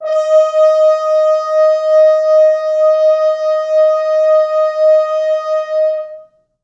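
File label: French horns sustain D#4; East West; Quantum Leap Horns Sax; 4 FrHorns SUS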